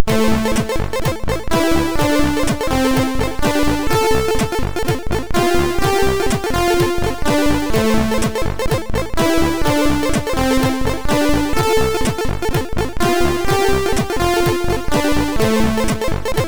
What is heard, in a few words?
Soundscape Sample sid MOS6581R2